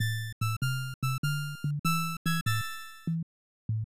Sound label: Logo Jingle; SM Choukyoushi Hitomi Vol. 2; SNES